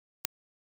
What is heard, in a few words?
peak single -1dbfs